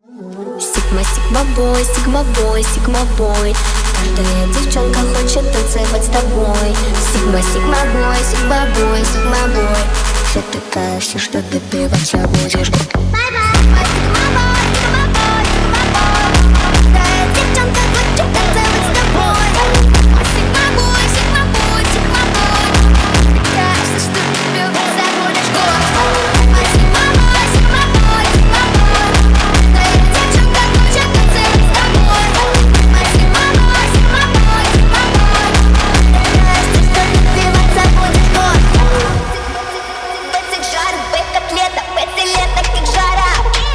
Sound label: ultrafunk brazilian phonk